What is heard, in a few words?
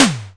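sds 8 snare drum, Simmons SDS8; DRUM MACHINES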